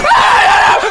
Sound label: combo break